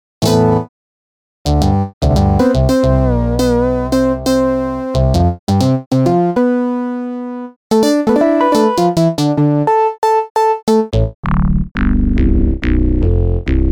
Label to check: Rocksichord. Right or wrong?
Right